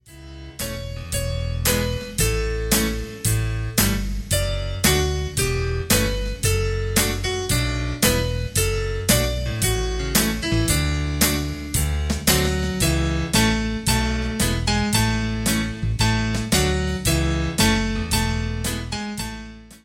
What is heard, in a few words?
Sample, Band; All Things Bright and Beautiful